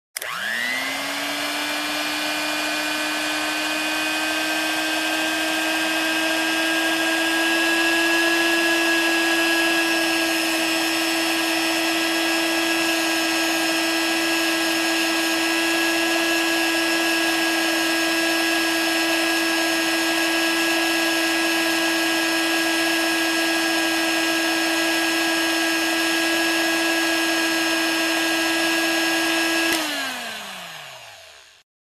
Electric Hand Mixer turned on

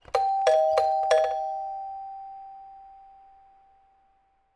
Doorbell ringing twice

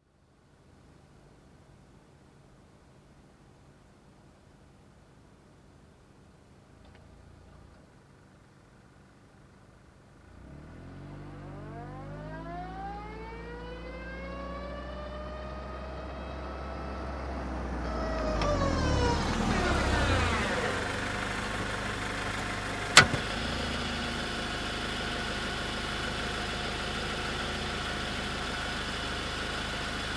Car reversing and stopping nearby

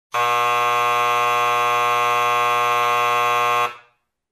Buzzer Horn

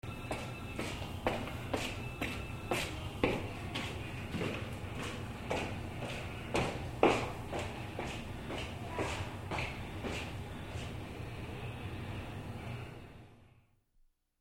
Footsteps in hallway passing by

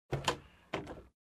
Overhead Bin Closed

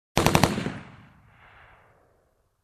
Machine gun shooting in distance

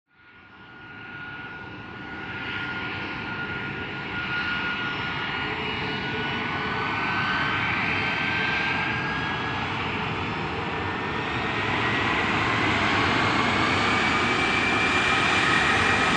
Aircrafts Ground Rolling from Hangars

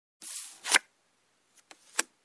Play Cards Dealing

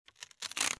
Ripping off printed sticker

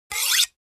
Sharpening knife

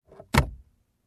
Closing compartment in car

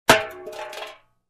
Kicking a Can

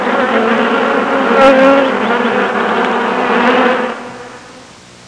Swarming insects

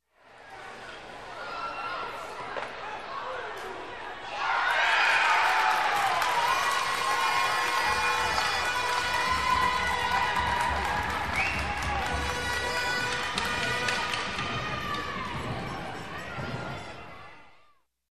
Crowd in a game cheering with horns

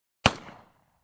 Sniper shot sound

